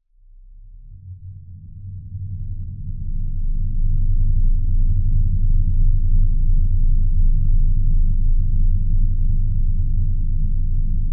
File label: sound design lfe rumble air movement very low pitched med